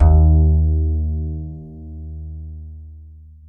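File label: acoustic bass soft D#2; Masterbits CLIMAX 7 - Bass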